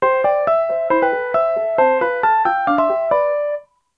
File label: Ringtone; to the moon